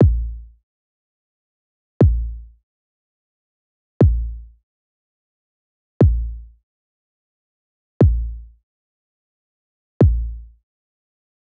kick; hydrogen

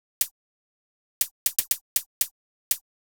Closed HH; hydrogen

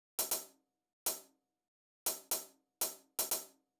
Closed Hat; hydrogen

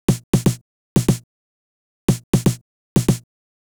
909 snare; hydrogen

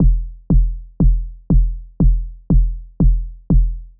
Kick; VariBreaks; hydrogen

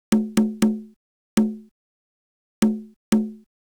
626 Conga Low; hydrogen

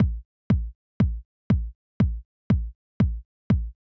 TR909 Kick; hydrogen

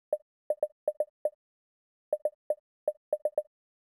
Conga; hydrogen

